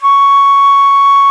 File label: C#5 FL; PIPE; AKAI S-Series CD-ROM Sound Library VOL-8